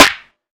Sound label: acoustic snare sound d sharp key; snare drum samples